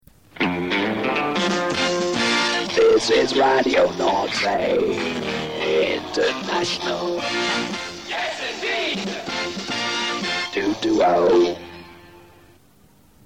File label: jingles